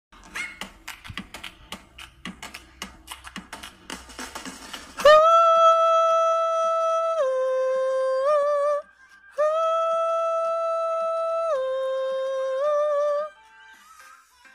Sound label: Ouh ouh ouh ouh